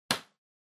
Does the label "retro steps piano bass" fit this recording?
No